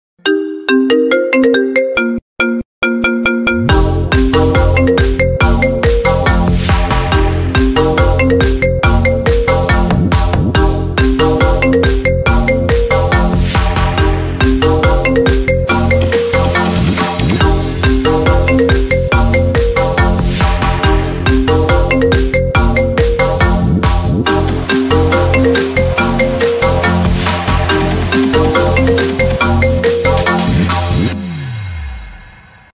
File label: iphone 15 plus remix ringtone